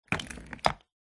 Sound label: household vacuum cleaner set down on tiled floor while off